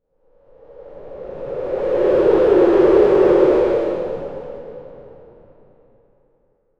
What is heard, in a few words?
wind blowing transition